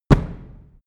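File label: step land reverb low, footsteps; schritte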